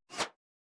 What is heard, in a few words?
bullet whiz